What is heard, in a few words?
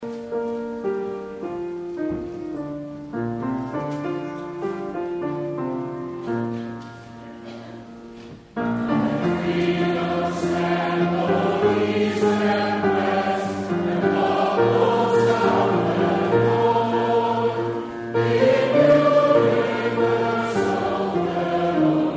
Hymn